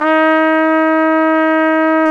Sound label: Solo Brass, Tpt p, AKG